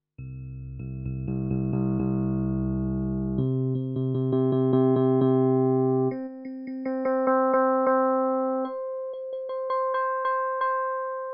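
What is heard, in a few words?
K150 electric piano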